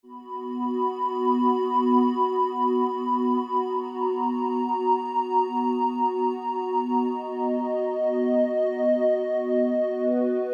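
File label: JP8 square PAD, Roland SC-880; Factory Patches; Preset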